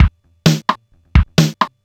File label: RZ-1 + LOOPS